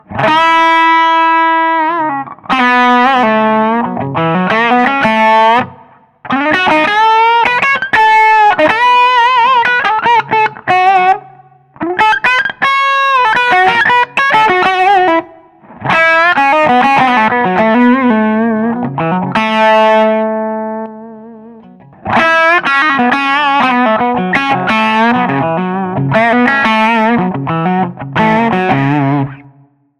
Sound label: Clean Bright up Mid Boost off Jazz; ODS; Vol 14; Treble 13; Master 17.0